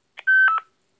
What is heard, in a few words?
roger beep mod